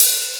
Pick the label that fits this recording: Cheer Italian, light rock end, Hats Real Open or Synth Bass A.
Hats Real Open